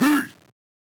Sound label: Warrior Boost; Dungeon Marathon